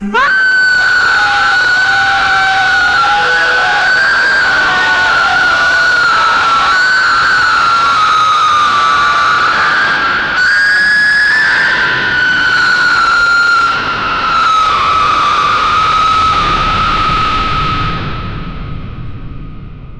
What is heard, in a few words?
deathmetal music sounds, aggressive